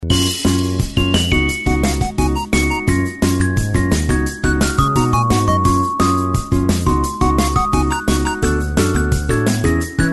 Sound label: bgm game; Helloween; plinko